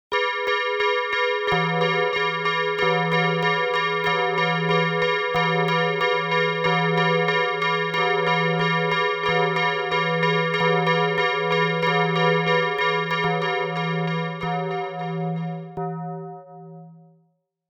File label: bells